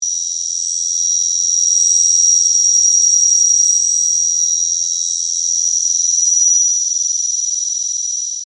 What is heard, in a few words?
Laser Beam Cut High, Pitch; Laser Sound Effects; BlastWave